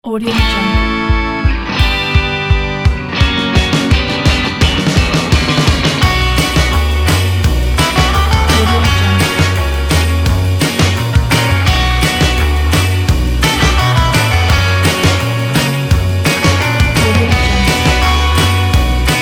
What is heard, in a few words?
surf latino